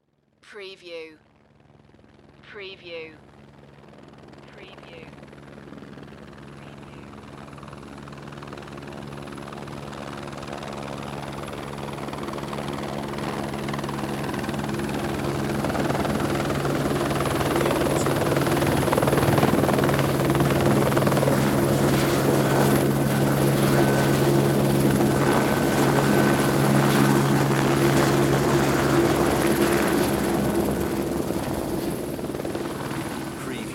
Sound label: Helicopter Approach Hover Fly Away